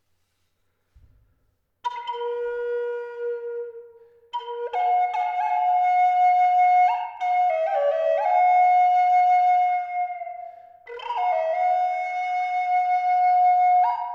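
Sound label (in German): Eastern Red Cedar 6 Loch B hoch 432 Hz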